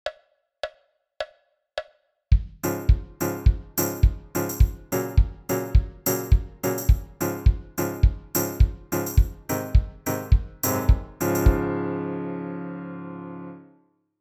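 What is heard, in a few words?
example of better timing, banjo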